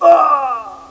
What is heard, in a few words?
death